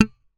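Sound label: thumb, bass; Xsample VOL-1; SLAP+THUMB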